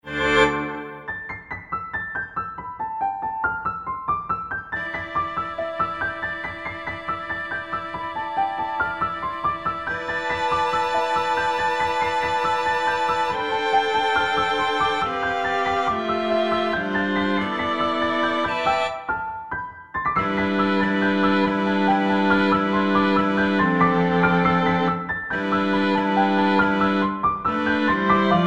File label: piano trio middle